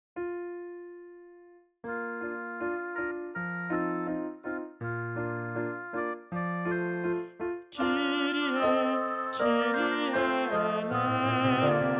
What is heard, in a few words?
kyrie tenor